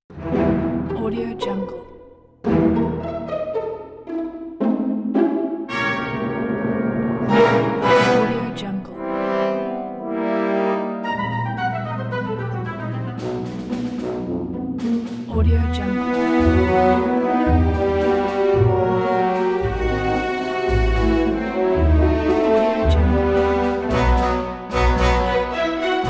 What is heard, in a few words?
halloween orchestral